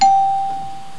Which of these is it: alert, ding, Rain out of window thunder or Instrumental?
ding